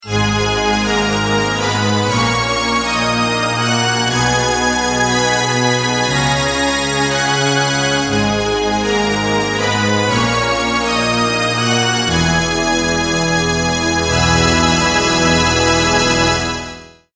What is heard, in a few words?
orchestra